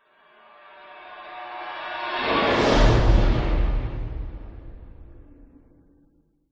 horror whoosh evil scary

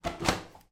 household window bathroom open

